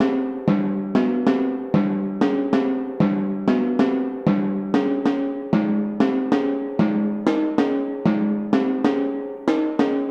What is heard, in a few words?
Timpanis, 095 Cmin; Apple Loops; Big Fish Audio